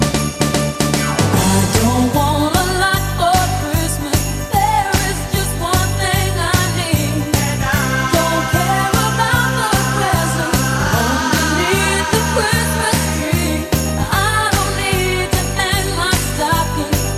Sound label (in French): sonnerie